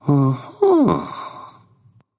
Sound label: nv thinking; smite; voicepack; ganesha